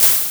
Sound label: clap; kogamusic